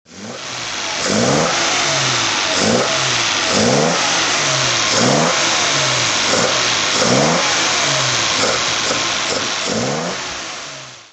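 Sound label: alfa romeo 2600 spider throttle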